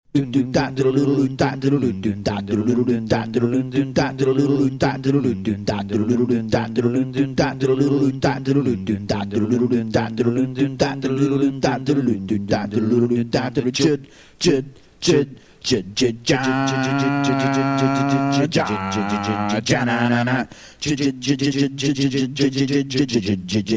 bass in the beginning song

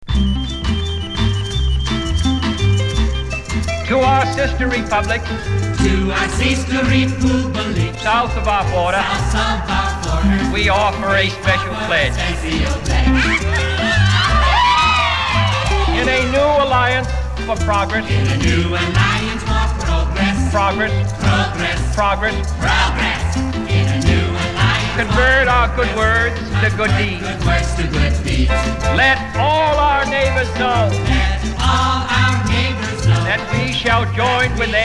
Bossa Nova; Sing Along With JFK

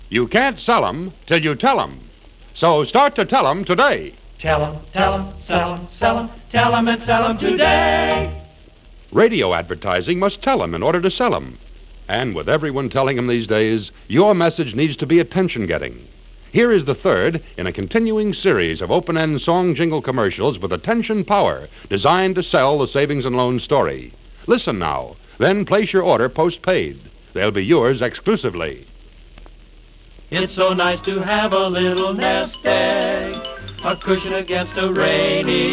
singing commercial; Advertising Division; 3rd Series Singing Commercial Service

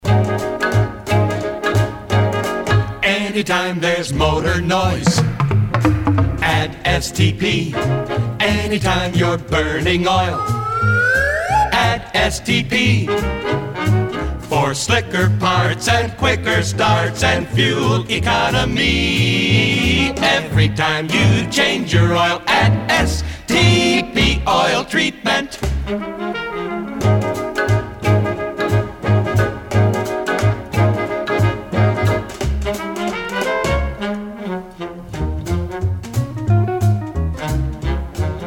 STP Jingle; Lorin Frank Productions

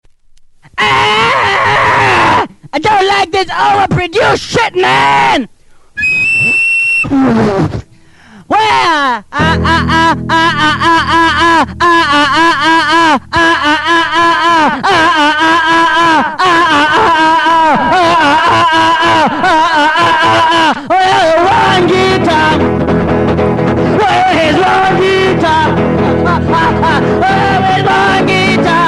One Guitar; FCC

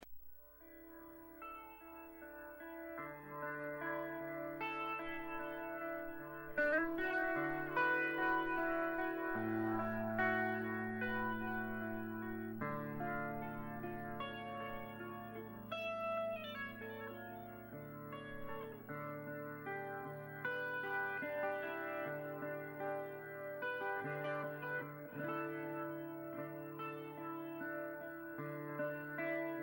ELEC GTR

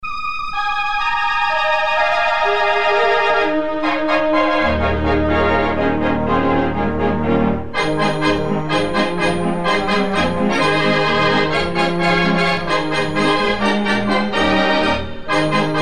Jingle Bells; Special Ed Xmas

